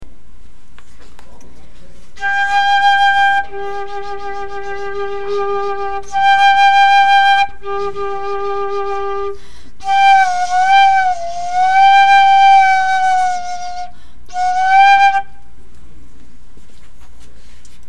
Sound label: pvc flute